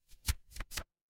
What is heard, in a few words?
paper fabric page turn